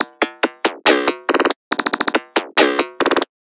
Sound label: Loop